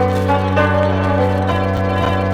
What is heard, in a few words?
guitar, Loops